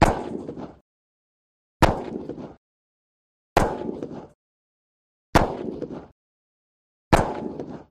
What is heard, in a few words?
12 guage Shotgun Sing; shots